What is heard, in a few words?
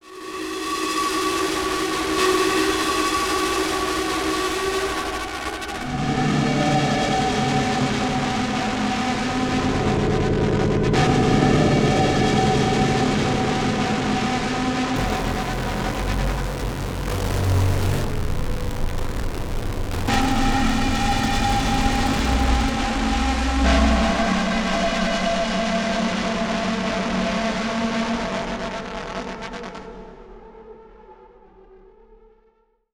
Distortion Static Delays; Module Production Element Title Imaging; Imaging Elements